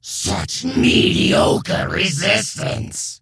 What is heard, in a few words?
slay, creature; Shadron